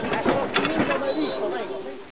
warehouse; Caesar III

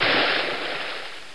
Fire splash; Caesar III

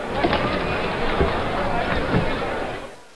warehouse; Caesar III

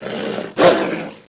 wolf attack; Caesar III

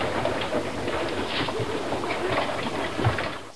house; Caesar III

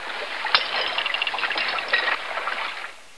pottery workshop; Caesar III

